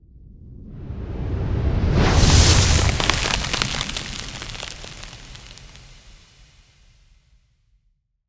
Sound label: Magic Spell Turn to stone; Sample Audio Pack; Free Pack